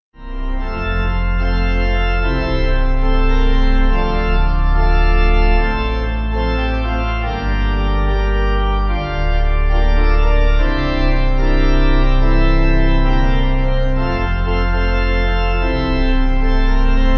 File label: Plain Pipe